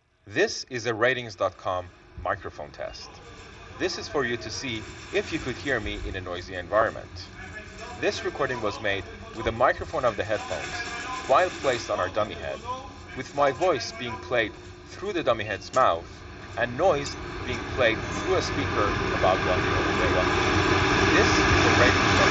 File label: internal mic subway